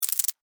Cockroach Scurry, Cockroach, Scurry, Short, Movement, Insect, Bug; Cockroaches; Blastwave; Animals:Insects